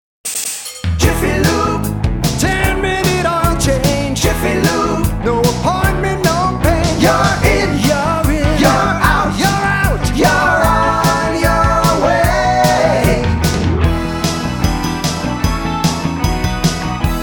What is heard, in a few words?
Jingle; Jiffy Lube